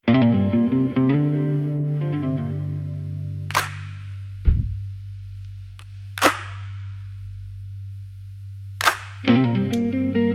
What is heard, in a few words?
stem instrumental